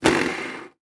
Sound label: arcade machine golf game spin wheel hard